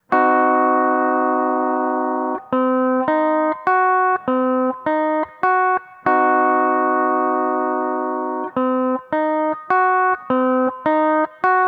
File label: c dim triad, steel guitar